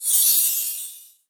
Zelda Grab Magic; Magic sound during Zelda's grab

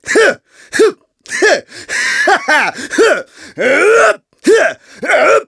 Multiple Slashes; Ghirahim making multiple slashes at Impa's force-field; Skyward Sword

